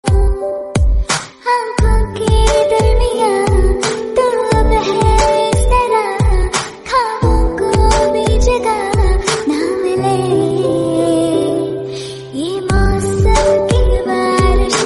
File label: bollywood romantic